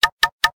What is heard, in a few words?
Knock; Optimus F3 MS659